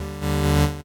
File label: 8bit se